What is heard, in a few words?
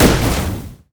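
q spell explosion; LoL; ziggs poolparty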